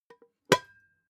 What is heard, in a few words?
pot lid open plop; objekte; topf